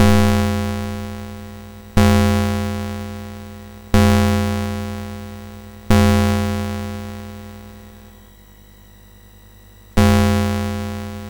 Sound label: Ding Dong Bells, MOS6581R4